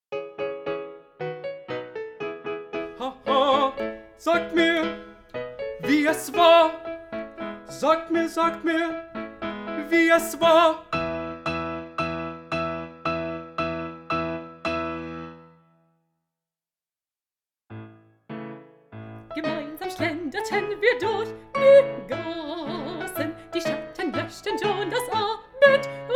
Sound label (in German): Chor; rigoletto